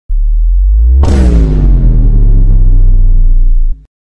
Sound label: Bass Drop Vine Boom